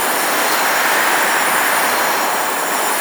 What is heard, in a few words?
prowl loop; nidalee